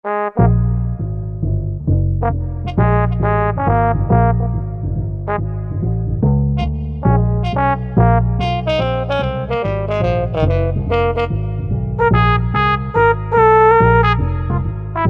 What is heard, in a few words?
Bass-Bones, Korg MSC-11S Brass